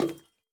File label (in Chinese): 铜灯踩踏声